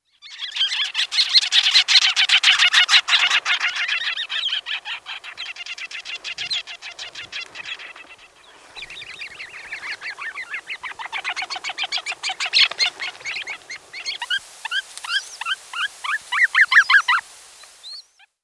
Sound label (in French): chant